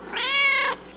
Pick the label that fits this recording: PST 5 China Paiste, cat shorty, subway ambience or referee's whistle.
cat shorty